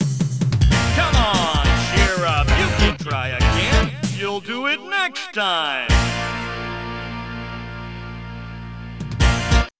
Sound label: game over; arcade; ridge racer